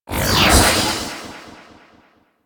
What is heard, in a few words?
Ability Cast; Warframe; Warframes; Decree